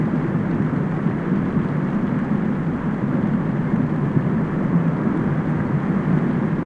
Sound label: Ambiance; Knytt